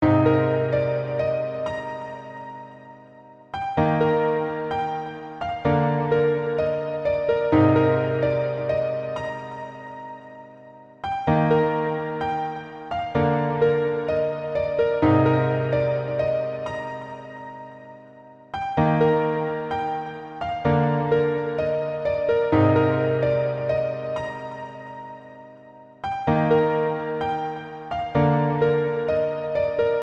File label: Melody Piano